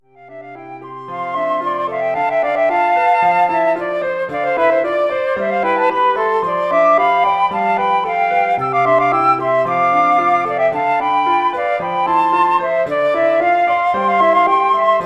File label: flute duet + PA